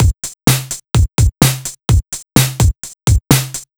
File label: 127 bpm full, drum loops; Zero-G; Soundclash Nu Dance Electro; Acidized Wavs; Minipops